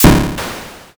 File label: thunder magic